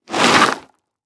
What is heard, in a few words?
Impact Plastic Drag On Rock Salt Quick